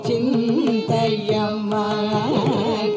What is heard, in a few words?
Bhairavi Shruti E